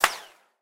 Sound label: bullet ricochet